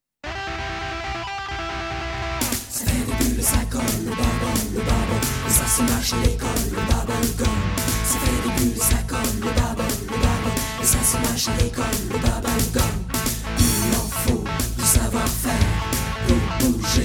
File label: Bubble Gum Hard Rock